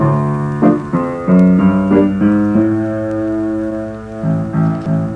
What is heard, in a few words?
piano, Phonky loops